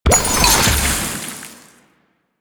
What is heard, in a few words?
infested mass appear; EndlessCapture